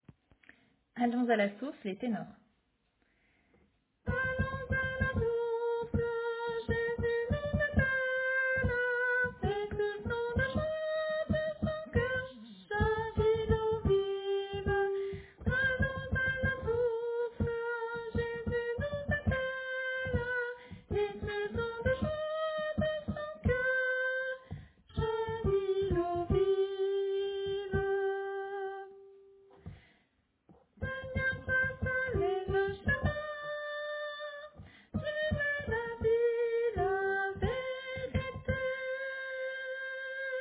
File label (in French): Tenor; St-Jean-Baptiste-de-la-Ste-Famille